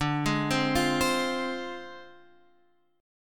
F Minor, chord; Fm/D arpeggiated; JGuitar

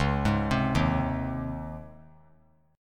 Db Major 7th Suspended 2nd Db; 13,15,15,13; DbM7sus2 arpeggiated; JGuitar

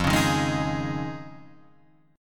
F Minor 7th Sharp 5th, Guitar, Half Step Down

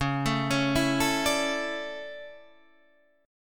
D Major 7th Suspended 2nd

